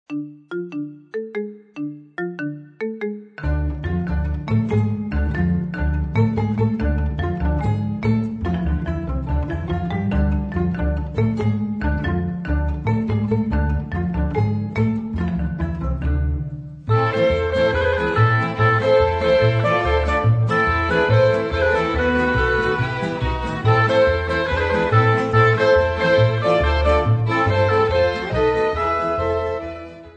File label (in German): Israel, Accordion; Simchat He'amel